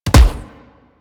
Weapon Punch Bow; Warframe; Weapon Punch Layers

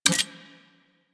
Rifle Recharged; Warframe; Weapons; Wisp Rifle

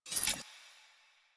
Idle Sword Drop; Arthur Hub; Warframe; NineteenNinetyNine

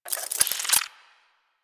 Tech Movement; Ambience; Warframe; Corpus Railjack

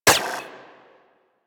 Braton Prime Redux Prime Layer, weapons; Warframe

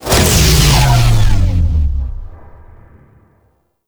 Race Mode Ship Shield Down; Warframe; Ambience; Space Battles

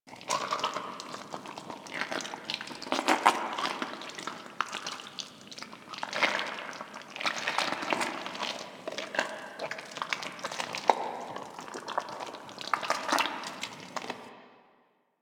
gore loop squishy thick slow; Ambience; Props; Warframe; Infested Eris

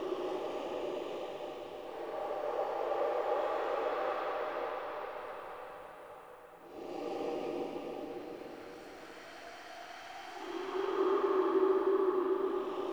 Chest Idle Loop Uncommon; Objects; Chests

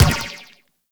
Ball Bounce Rock; Warframe; Missions; Tenno Ball

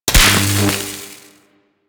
Mining Laser Fire; Weapons; Eidolon Plains; Warframe; Eidolon Mining Lasers

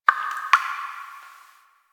Drip; Warframe; The New War; Zariman

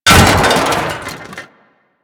Fan Blade Destroy; Objects

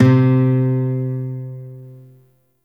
Shawzin Oct One C; Warframe; Lotus Shawzin

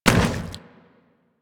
Zombie Step Metal, Footsteps, Walk; Warframe; Infested

